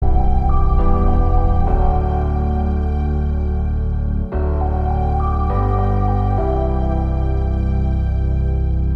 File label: emotional instrumental